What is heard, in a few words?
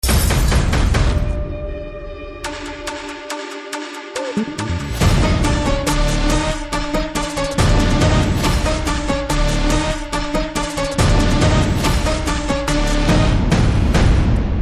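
Trailer background music Theme Ringtone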